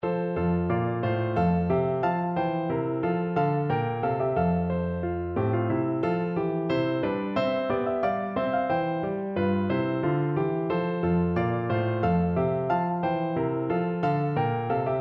bouree piano; handel water

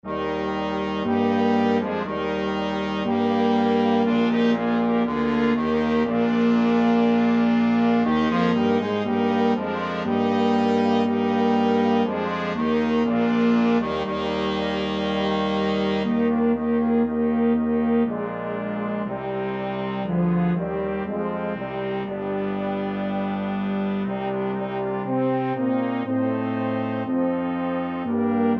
flexible mixed ensemble, 4 players

